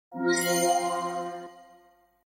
Power Get

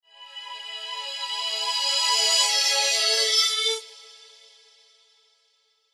Message teleport

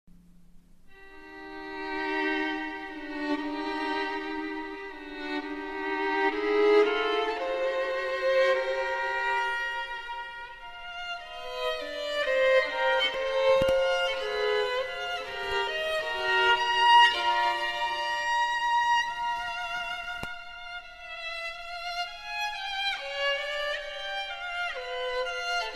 Violin

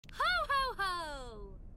Hohoho; Tracer

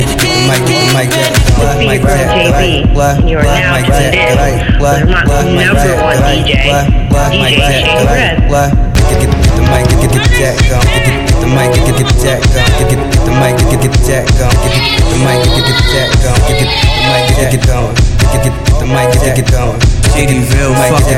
Vocals

